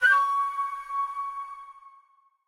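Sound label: Skill background music; Naruto Ultimate Storm; android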